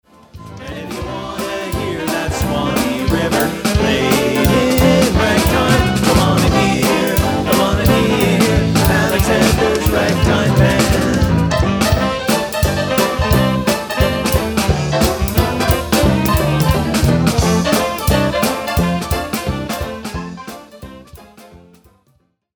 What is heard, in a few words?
ragtime band